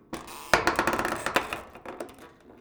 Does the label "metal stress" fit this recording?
Yes